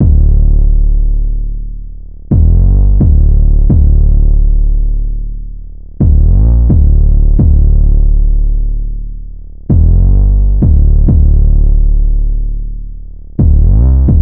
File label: 808 loop 130 Dm; Slate Digital BASSHOLE Sample Pack; 808 Loops